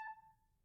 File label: Grand Piano Main Release A4, Kawai; Legacy